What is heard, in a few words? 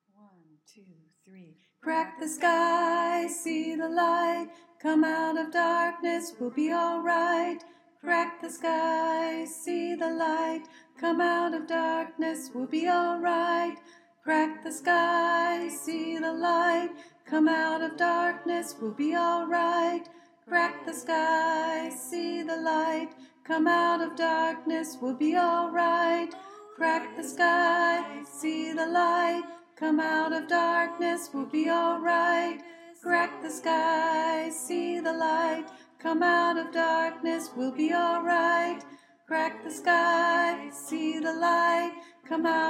Soprano, choir